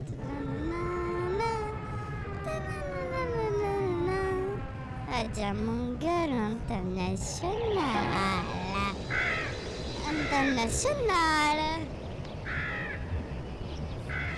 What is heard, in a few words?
jingle